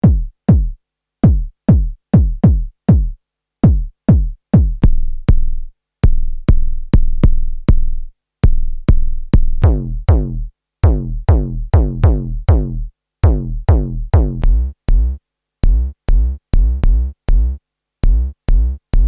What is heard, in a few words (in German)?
Bassdrums